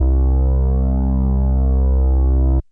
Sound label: ANALOG, BASS; Best Service; Hyper Dance